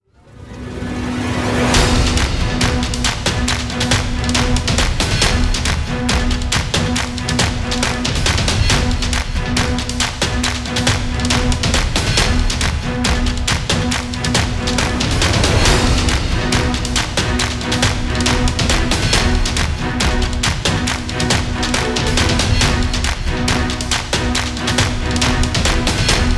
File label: Corporate Warrior; Ryu ga Gotoku 7: Hikari to Yami no Yukue